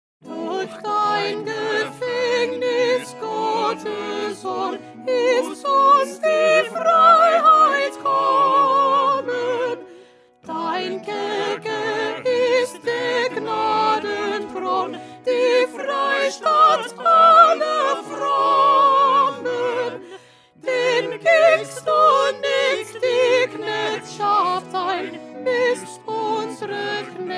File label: Soprano; Bach; St John Passion